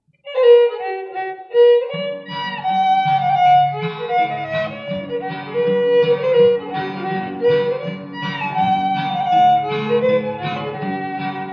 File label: Old Country Schottische